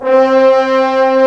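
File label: C4 French horn; Timidity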